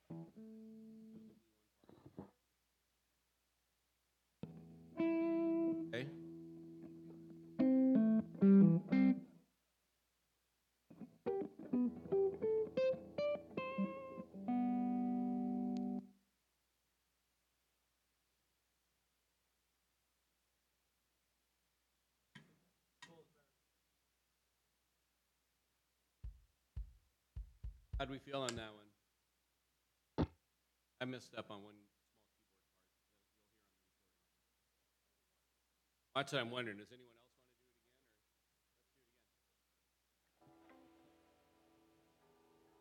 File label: Vocals